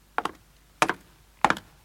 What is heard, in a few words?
steps upstairs; baileywiki maps premium towns